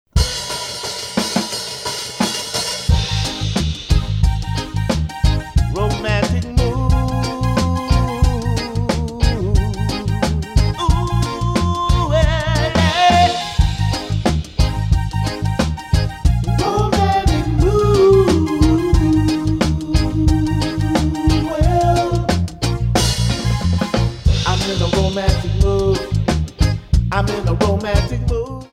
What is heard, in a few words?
Romantic Mood